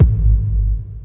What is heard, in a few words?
BD, Dance Drums